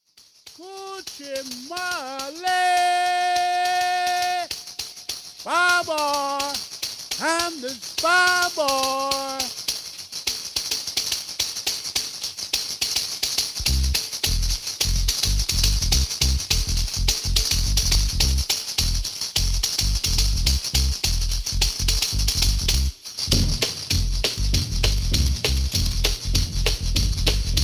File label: drum